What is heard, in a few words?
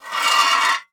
Metal Slide; 1001 Sound Effects